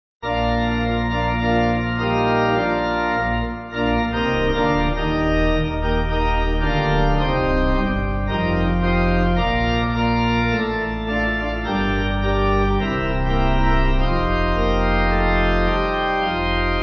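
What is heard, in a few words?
Pipe, hymn backing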